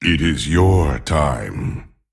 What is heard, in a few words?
Male Anubisath Attack